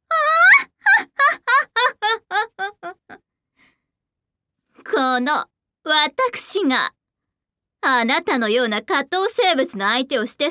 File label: laugh queen